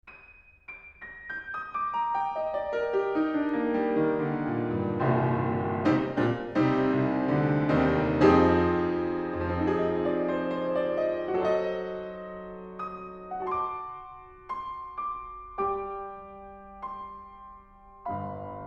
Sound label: Piano, Vert 155cm Mic